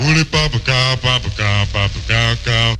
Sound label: voice hooly poppa cow poppa hooly poppa cow cow; Loops; Phonky